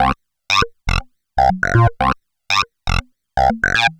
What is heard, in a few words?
Synth